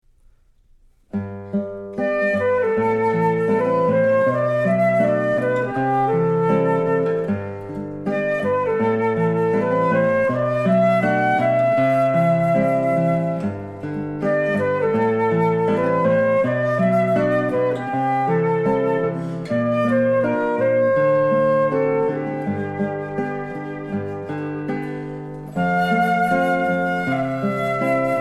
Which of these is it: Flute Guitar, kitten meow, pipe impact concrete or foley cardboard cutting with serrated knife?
Flute Guitar